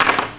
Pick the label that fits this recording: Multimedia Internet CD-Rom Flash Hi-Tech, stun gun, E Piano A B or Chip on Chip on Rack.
Chip on Chip on Rack